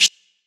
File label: Vocal Glitch